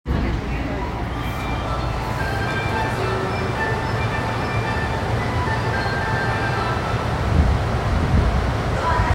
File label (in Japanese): 半蔵門線半蔵門東